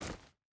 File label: footstep hide stones run; Rust